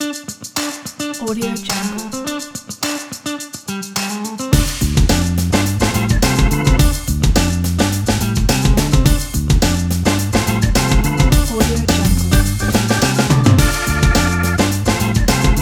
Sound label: funky groovy positive